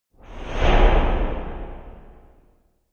Whoosh Long Tail; Whooshing sound for video editing, movements, logos, flash, or animation; Whooshes

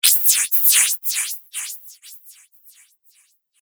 Laser Whispers, sci-fi laser sound effect; Laser Whispers 2; Andrew Fleming

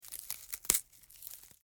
Wood Break Snap Crack Foley; Small; Materials; BBFX

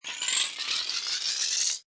Cartoon Wind Up, Rattling upward spiral.; Comedy/Cartoon; Comedy/Cartoon, Wind Up 01: Rattling upward spiral; Cartoon Sound Design

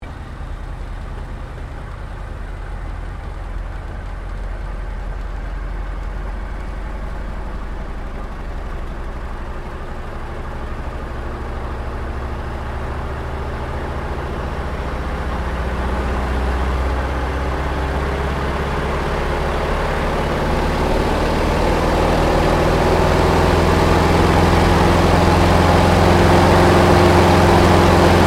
AIRCRAFT T-28D TROJAN TAKEOFF INTERIOR; Airplanes; Vehicles

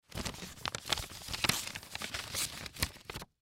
Envelope Insert, Envelope Insert Letter; Office; Blastwave

